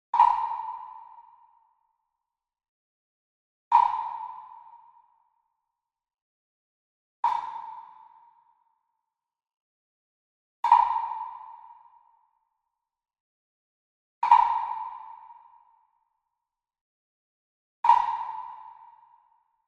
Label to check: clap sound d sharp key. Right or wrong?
Wrong